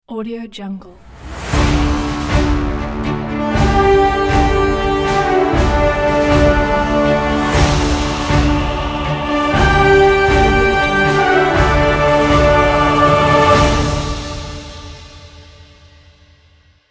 award opening logo

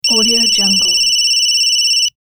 phone ring

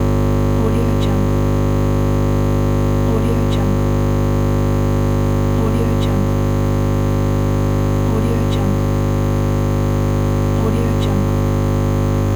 electric buzz

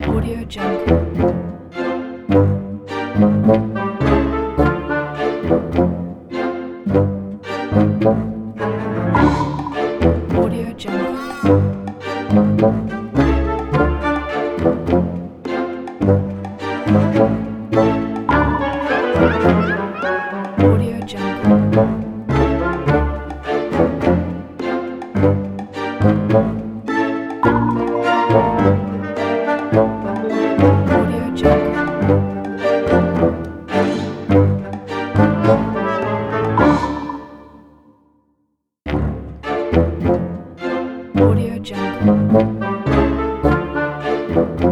cunning kit

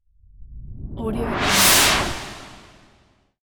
whoosh

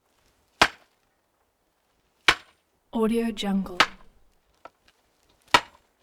stick hit wooden wack or smack choppy; prosoundfx

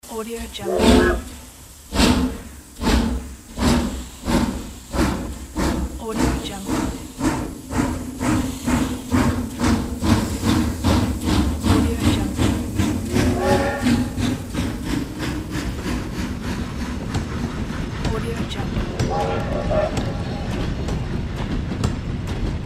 train

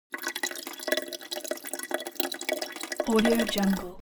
object bathroom sink drain

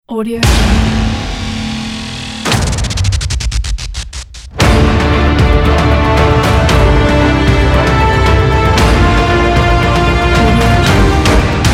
epic action news intro

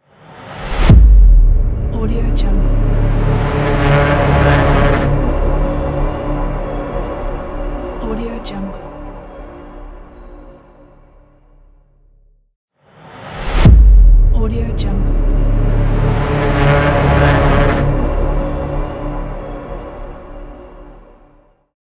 cinematic transition boom; phillipmariani

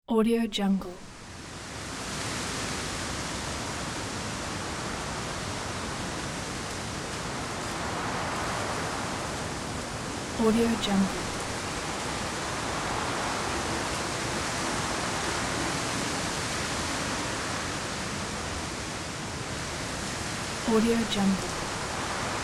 ocean wind

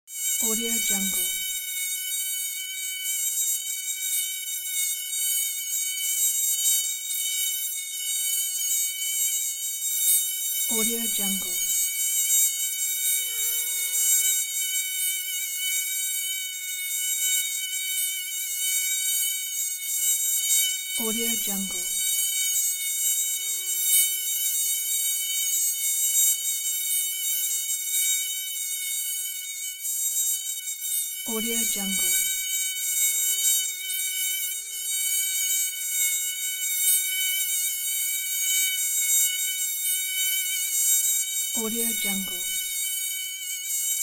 mosquito buzz

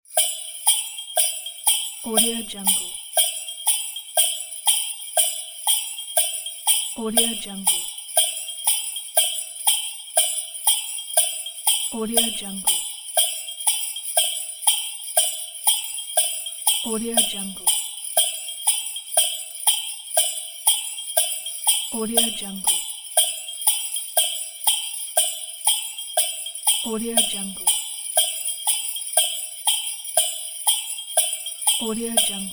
christmas jingle bells wood block bed

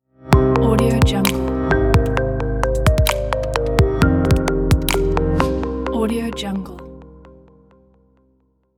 abstract logo

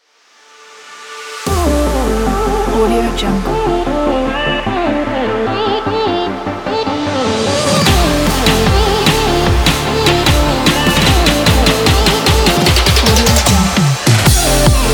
dubstep

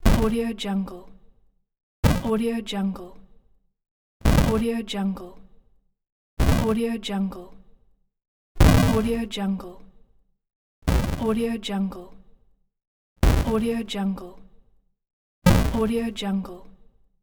8 bit hit

